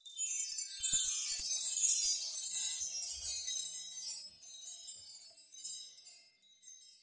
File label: chimes